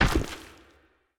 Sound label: movement step; smite; god odin